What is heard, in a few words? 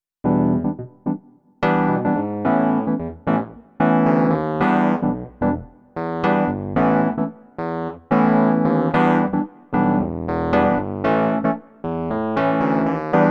E-Piano Wurlitzer